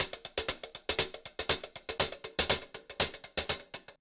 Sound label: 120, Top Ends, Beats; Zero-G; Monster Beats